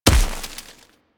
Snow Ball Impact; Warframe

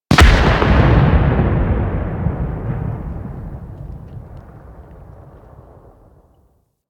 Tower Mining Burst B; Warframe; Ambience; Eidolon Plains; Ostron Village

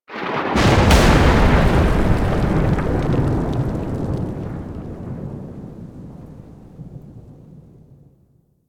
Intro Lightning; Warframe; Tutorial

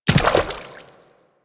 Infested Medium Land Water, Footsteps; Warframe

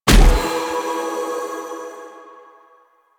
Choir Bow Arrow Explosion; Warframe; Weapons

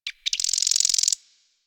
Forest Bird Squak; Warframe; Ambience; Grineer Forest

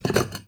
Vase Medium Slide

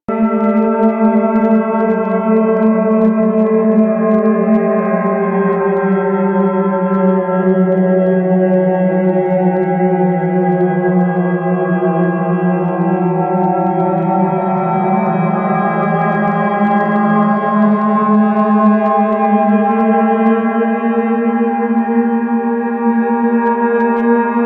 Glassy Moan Amb B; Ambience; Interior; Warframe; Orokin

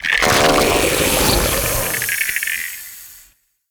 Infested Drop Pod Emerge; Warframe

